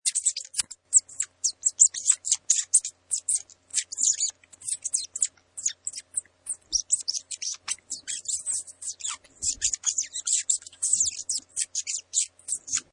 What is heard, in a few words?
Bats Few Squeaking, Bat or bats - One or few bats squeaking.; Animals